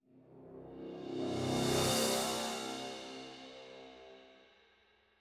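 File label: music draft pick intent to ban transition; champ select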